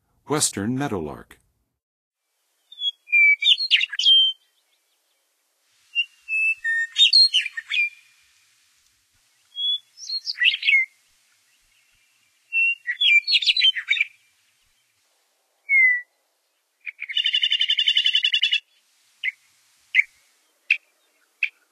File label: Western Meadowlark, Songs of Birds of North America; Western USA; Western USA Songbirds